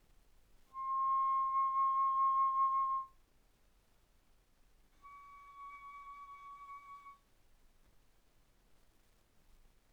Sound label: Bass Flute C6 Db6; ff